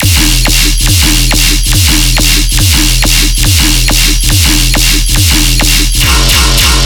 Bass Loop E 140BPM, Dubstep

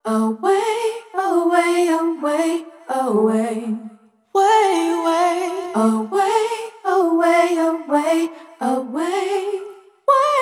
Vocal Phrase "Away" - 84 BPM G Min Wet; Vocals; Cymatics; ZODIAC Beta Pack

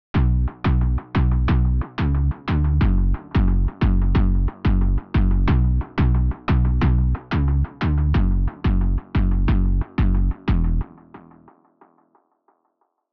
90 BPM B Min Bass; Melody; Loop Stems; Cymatics; Infinity Melody Collection (Preview); Maica

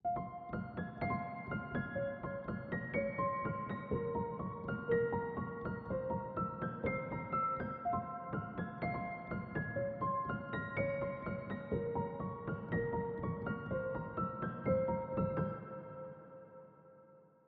123 BPM F Min Piano, Hip Hop; Melody Loops; Loop Stems; Cymatics; Vuelve

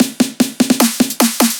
Dubstep Fill, 150bpm; Drum Fills; Ghosthack; Dubstep Sound Arsenal

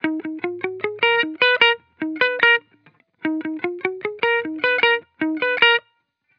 Hip Hop Guitar Loop - 150 BPM D# Maj; Cymatics; Vibrations Guitar Sample Pack

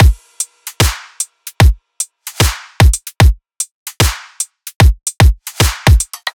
Drum Loop 150 Full; Loops; Ghosthack - Color Bass Essentials

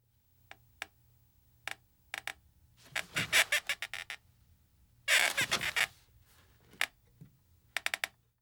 Squeaky Chair; Fairlight Sound Lib

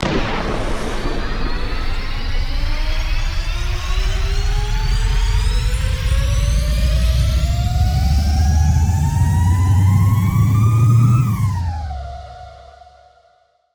Booster Sounds FX; Cymatics; Video Game Sample Pack Vol 1; Galaxy Rush

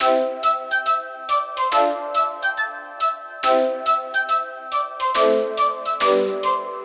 Happy Melody Loop - 120 BPM C Maj; Cymatics; Oracle Sample Pack

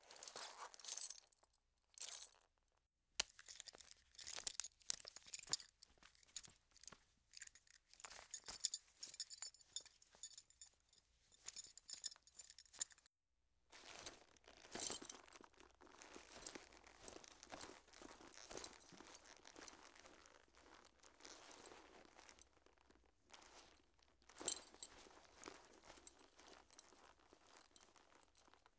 Tool Belt Movement; Fairlight Sound Lib

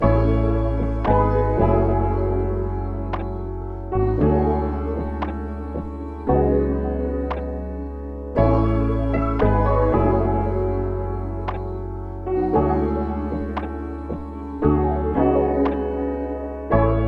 115 BPM E Maj; Cymatics; Zodiac Vol 2 Beta Pack; Zodiac Vol 2 Premium Compositions

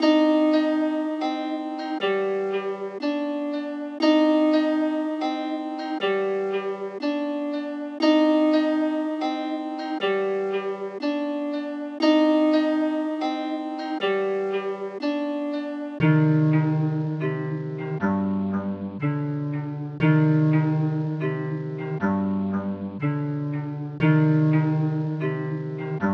120 BPM C Min Zither, loop stems; Cymatics; Combat Zone; 2022 Melody Collection Platinum Expansion

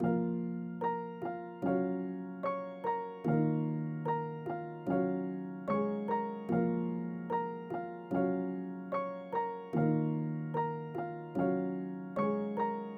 Key, 148 BPM A# Min; Stems; Cymatics; Hearteater

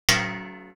gong, Cartoon Film Effects

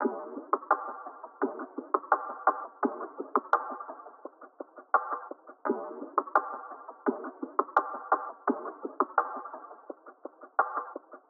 Drum Loop - 170 BPM Percussion; Cymatics; Anthem Drum Loop

